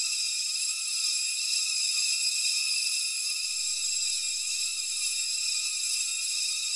amb abstract whispy lp, ambient; Half Life Model Pack

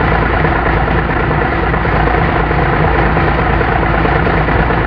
diesel engine idle, machines; Half Life Model Pack; Half Life 2

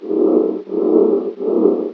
fan, ambient tones

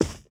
carpet, footsteps

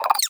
vox twitch, creatures, snark

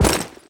defuser impact; physics; weapons

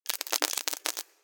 substation oneshot; nuke

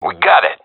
cheer, seal diver; vo; agents; Source Model Packs; Counter Strike Model Pack; CS2

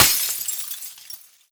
break glass bottle small; physics; breaking

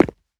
rubber, footsteps; player

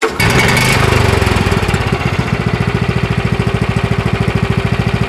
generator start loop; ambient; machines; Counter Strike Model Pack; CS:GO

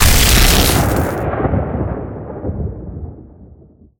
shockwave projectile end, substation; mechanical units; Half Life Alyx

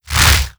shockwave whoosh

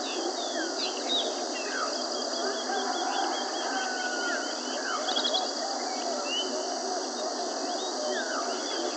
shacks bg int lp, ambient atmosphere

